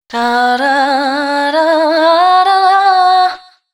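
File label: female, Dance Ultra Voice, adlibs; Mutekki Media; Dance Ultra Voice VOL-1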